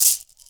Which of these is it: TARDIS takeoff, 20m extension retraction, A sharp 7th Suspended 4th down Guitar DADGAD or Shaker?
Shaker